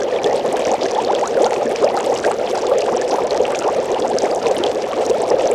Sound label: Potion Loop; kult